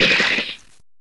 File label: Bite